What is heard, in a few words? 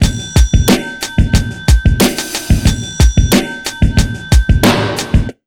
BEAT L, Pure Electro; USB Soundscan vol.03; AKAI